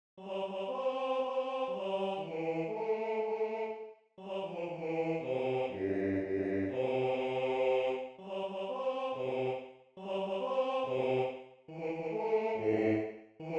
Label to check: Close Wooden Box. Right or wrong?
Wrong